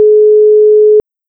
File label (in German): weckzeichen